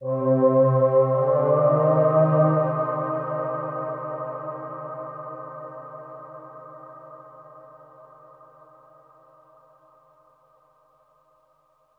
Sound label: synth pad ambient 160 C; Sphere